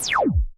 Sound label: ZAP FUSE